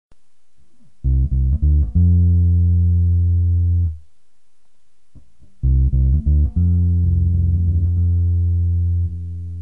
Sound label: delay, bass